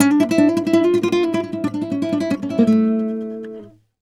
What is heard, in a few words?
Flamenco, Guitar, a